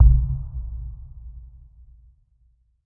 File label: Bass Impact Low Drum; impacts